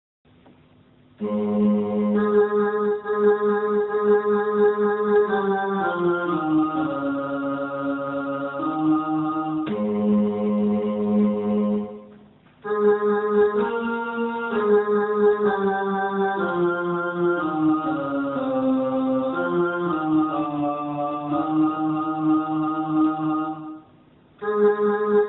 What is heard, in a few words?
Veni Creator POLIFONICO BAJO; PENTECOSTES; Fiesta